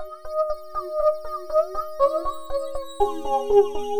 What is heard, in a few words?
B 120, Synth Loops 120bpm; Acidized; Zero-G; Soundclash Nu Dance Electro